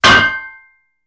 Metal Hits Metal Pipe

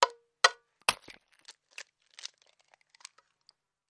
Breaking An Egg Open

